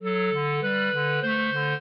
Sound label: clarinet; Mozart